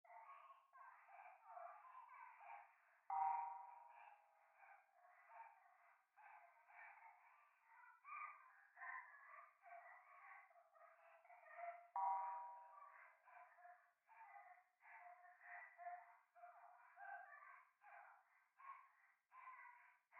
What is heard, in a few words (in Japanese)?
夢の中 可愛い喘ぎ 寺